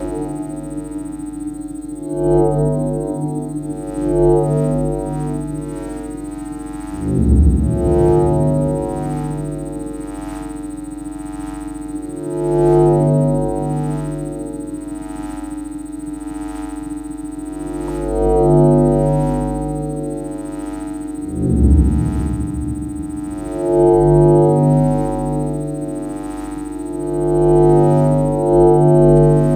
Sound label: Idle Pin Screen Loop